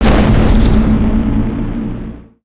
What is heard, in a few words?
Siege Tower Fire; destructibles